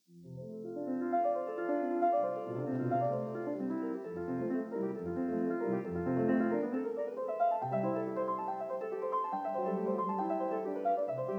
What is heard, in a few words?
Prelude No. 23 in F major; F. Chopin; 24 Preludes, Op 28